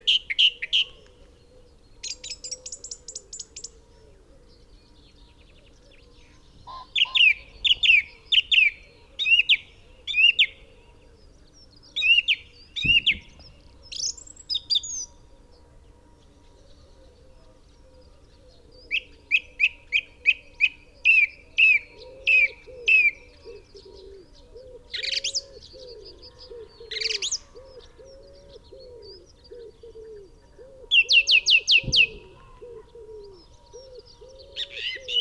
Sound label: Song Thrush Turdus philomelos; David M